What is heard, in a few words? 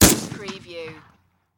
Shotgun Gaucha-Iga410; sound effects bible